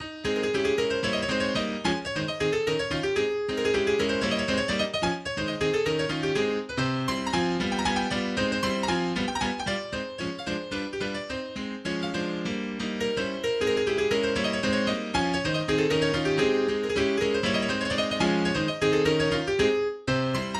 Mazurka No. 8 in A Flat Major, Presto, ma non troppo, MIDI; Opus 7 No.4; Mazurkas